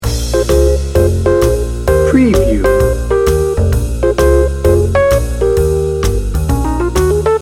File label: two for cocktails loop